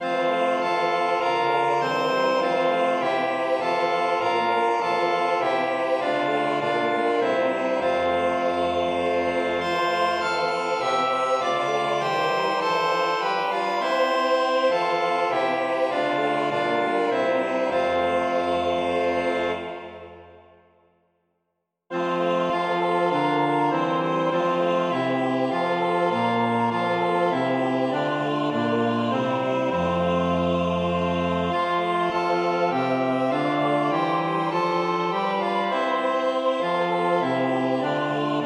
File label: polyinstru; hymns; Blest God and Father in Thy sight